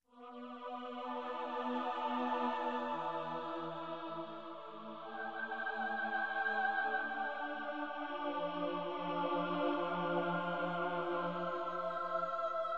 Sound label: Synthetic Choir Singing